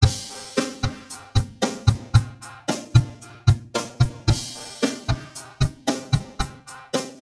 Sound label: strings; dafx10